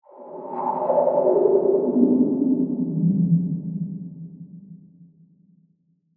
Bell Muted Reverb, Production, Element, Bell, Muted, Reverb; Reverse